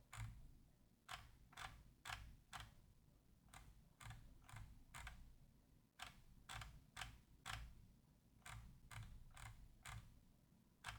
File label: logicool g502 x plus wheel sound